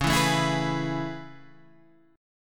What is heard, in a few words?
A Major 9th C sharp, chord